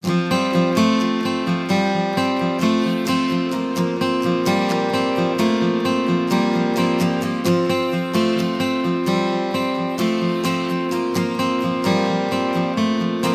Peaceful Strum; Samsung Galaxy S5; ringtones

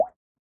Drop, notifications; OnePlus 11

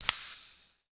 unlock, LG Stylo 4 Plus ui

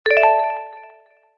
Crystal Ring, notifications; Itel it1406

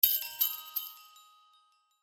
Shake Message, Sleigh Bells

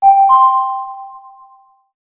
Dongle Alert; Sony Ericsson; C901; PlusFourSix; Lars Edvall

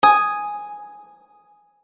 Pluck Email, Harp; HTC; Harp 1

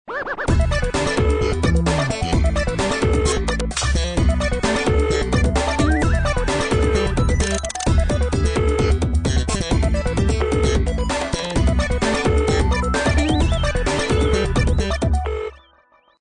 Melody, ringtones

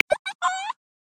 Arctic Fox; Xiaomi Redmi-8A

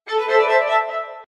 sms

